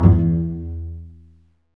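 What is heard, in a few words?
STRINGS, Cbs Pizz; Roland L-CD702; Cbs FX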